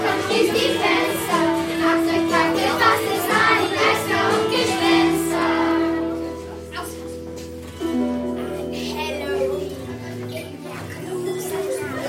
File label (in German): Lied Halloween